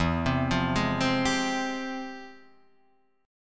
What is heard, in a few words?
F Minor Major 9th, E